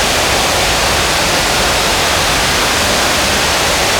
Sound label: Global Amb Loop